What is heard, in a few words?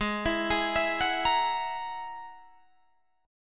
G sharp 7th Suspended 2nd Sharp 5th, Guitar, Drop D